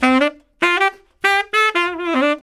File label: SAX LICK, BRASS LICKS; Ueberschall; Jam Box